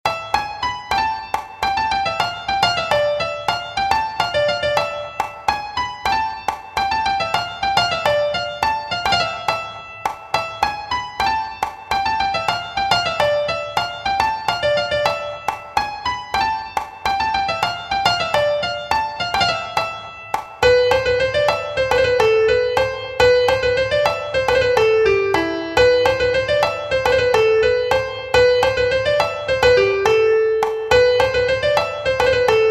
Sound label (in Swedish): Orrepolskan Älvdalspolska; efter lars Orre